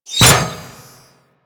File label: Kunai Impact Default; Warframe; Hit Effects